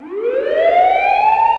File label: D2 SIREN; Zero G; Man Machine